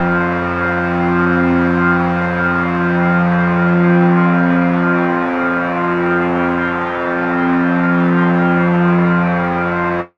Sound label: drone pipes F